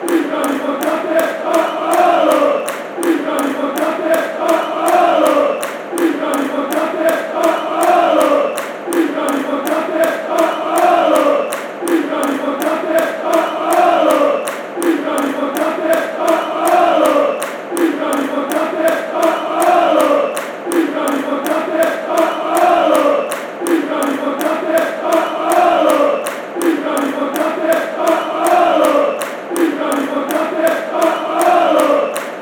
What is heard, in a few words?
fanchants